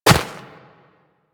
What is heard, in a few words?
Run Snow, Footsteps; Warframe; Operator